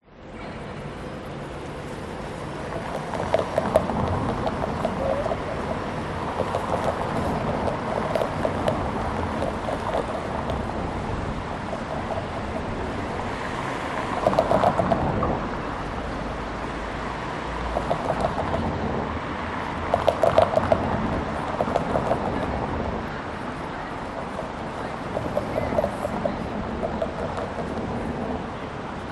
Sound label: public places city cars drive over tram lines in street light pedestrian chatter; sydney cbd